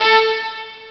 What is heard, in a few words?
strings + trumpet, Amiga Soundtracker Sample Packs; ST-XX Amiga Soundtracker Sample Packs